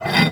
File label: Cast Iron Slide; Warframe; Awakening Remaster